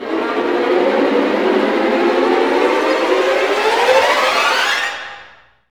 STR SEC, STRING GLISS

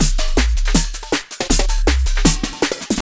JUNGL 160, JUNGLE LOOP

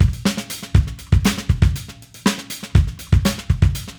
Funky Drums; Sonic Foundry (Sony Creative Software); What it Is! 70s Analog Funk; The Funky Mama

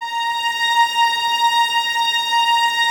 violins A#5, string ensembles; Miroslav Vitous; 11 Violins

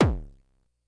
kick, Drum Machines, Korg DS-10

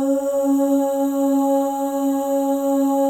OH, FEM, Symphony of Voices; Spectrasonics

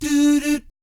DOO DOOT, Vocal Planet Jazz

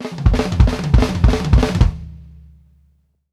DYNAMIC DRU, drumworks fills; Sampleheads; New York City Drumworks VOL-1; KD FILLS 120

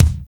Ruffle K; Roland MV8800; DAANZ KICKS

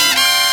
trumpets; E-MU Formula 4000 Series Vol. 1; Hip Hop Nation

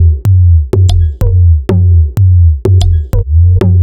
125bpm, House Drumloops

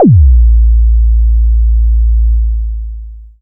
BD, Drums Dance & Groove; USB Soundscan vol.11; AKAI

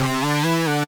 Stabs; Solilla; Akai MPC5000; Internal Rom 2

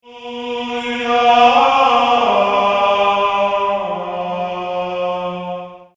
Monks Gregorian; vox mix; X-Static Goldmine

